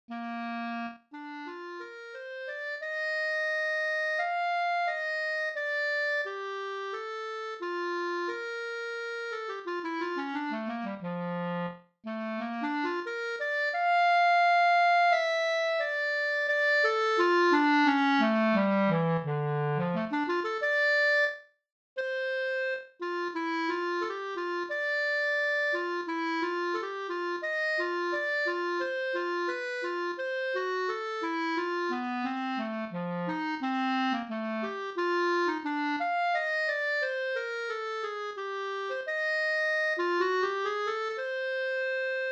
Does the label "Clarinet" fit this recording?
Yes